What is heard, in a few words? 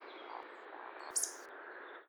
Eurasian Woodcock, Waldschnepfe